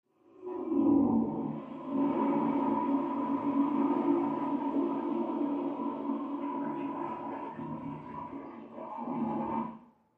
Toilet Flush; Water Flowing Through Pipes, From Next Room.